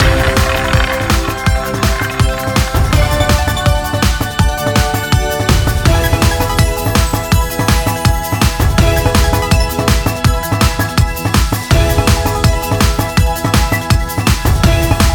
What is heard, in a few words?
music, roulette; wbg games